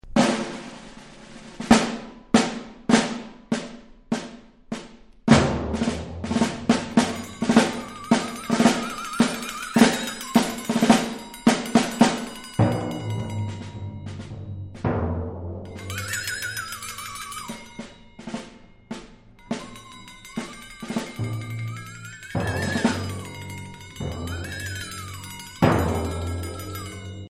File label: snare drum flexatone; bandjoun